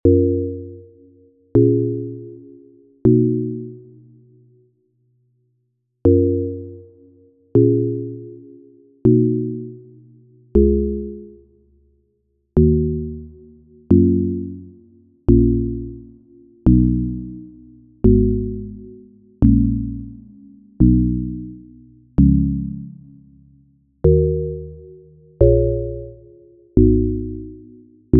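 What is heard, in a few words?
CHORD PROGRESSIONS Pop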